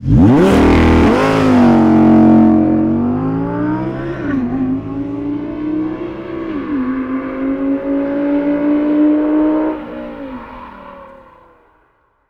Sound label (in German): Audi R8 Spyder Beschleunigungsfahrt Aussen mit LC mit SS; r8 v10 quattro; audi sport